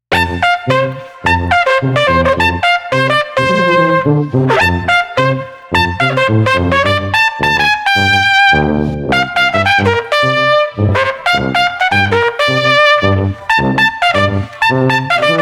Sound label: Street Tuba Trumpet Festive 107